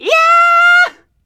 SCREAM; AKAI S-Series CD-ROM Sound Library VOL-6; SCREAMING

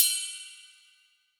808 & 909 Kit RIDE; Roland Juno-D

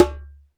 DJMB, Korg Electribe EMX-1

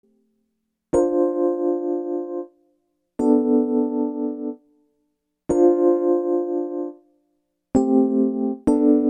Keyboard; Sonic Foundry (Sony Creative Software); Infinite Soul VOL-1

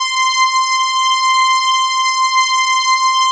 FAT P-10, PROPHET 10; Greytsounds VOL-1

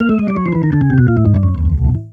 Organ Lick; Ambient Grooves; Organs Licks

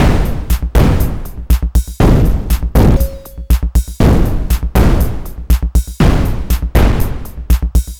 Subtractive Beat

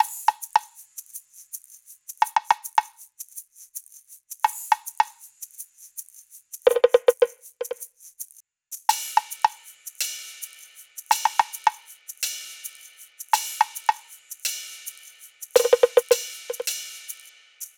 loop perc 108; Seismic Loops

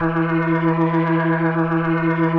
E3F, B3, Vintage Keyboards

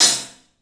HAT, CLUBHAT, 3D Drums; 3D Sound

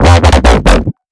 Primitive Growl BPM140 F, bass

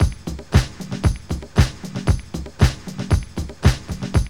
DISCO LOOP; Zero-G; Funky Elements

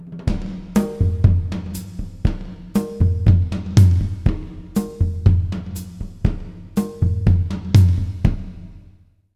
120 W side, jazz latin drumloops; USB Soundscan vol.08; AKAI

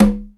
CONGA, PERCUSSION; Crypton - DrumBase5000

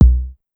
Kick, Pitched Up; Clavia DDrum-AT

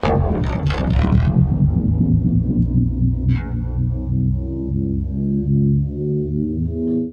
REV, Guitar

